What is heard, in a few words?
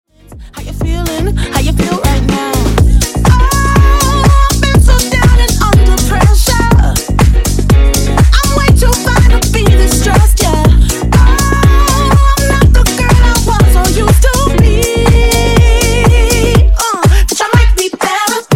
Funk Bootleg 122 Bpm